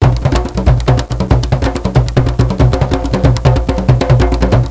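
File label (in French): tambour, drum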